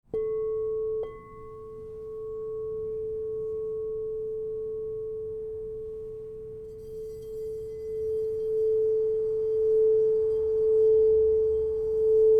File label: Crystal Tones Singing Bowls